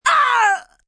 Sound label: Pain Choked, Pain, male, short, cut off scream, choke, agony, moan; human voice